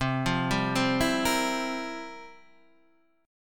F Major 7th Suspended 2nd Suspended 4th, C, guitar